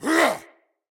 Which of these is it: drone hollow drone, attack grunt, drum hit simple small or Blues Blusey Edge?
attack grunt